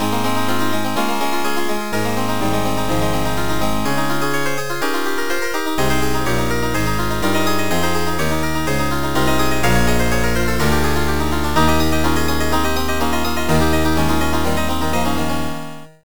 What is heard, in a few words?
fugue JI